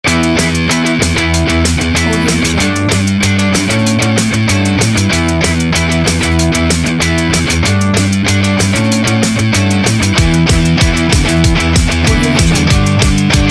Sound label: positive rock